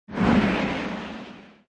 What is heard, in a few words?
Flying Wind